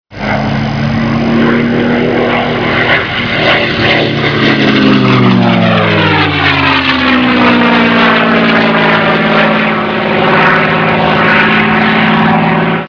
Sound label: Merlin, aircraft sounds; March Aviation Society; Airfest; March ARB